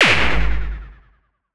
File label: Superkick Fx